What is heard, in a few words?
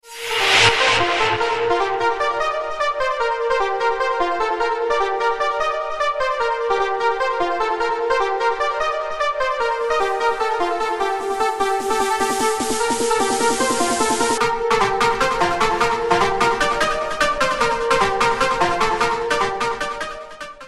jumpstyle remix